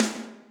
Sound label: snare, Millenium MPS-400